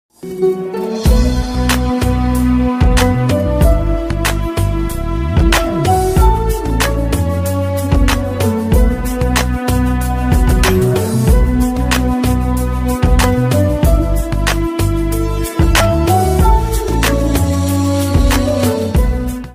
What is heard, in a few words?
ringtone instrumental bgm